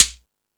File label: Timbale, E-MU Pro-Cussion; drum machine